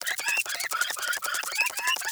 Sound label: Infected Buzzer Attack Loop; Hollow Knight